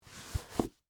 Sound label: Dress Shoe Off, No, Laces; Foley, Shoes, Take; Dressing